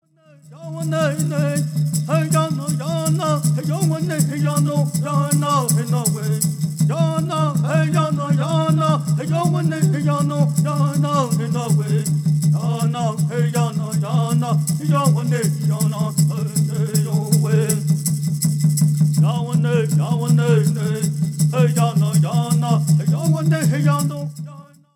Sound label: Straight Song